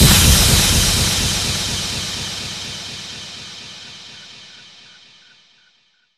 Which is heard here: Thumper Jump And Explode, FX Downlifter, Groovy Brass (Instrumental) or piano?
FX Downlifter